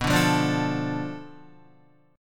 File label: B Minor 7th Flat 5th B Guitar, chord; Bm7b5 strummed; 6,5,5,7,5,5; 4F,4A,5C,5G,5C,6E; JGuitar